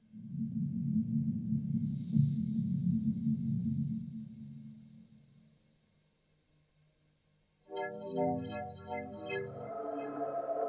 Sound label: Voice Abstract